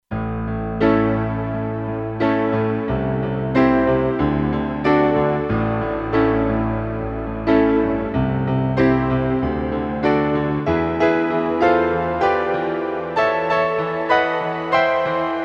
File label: Grand & Elec, Kurzweil K2500X; Factory Programs